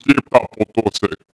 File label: ithorian run, creatures; Star Wars Obi-Wan; XBOX